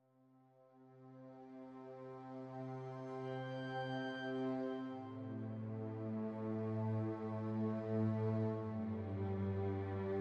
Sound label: Strings